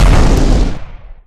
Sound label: flame explo